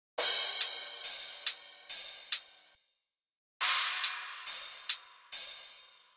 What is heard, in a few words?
Riddim Drum Loop 140 Top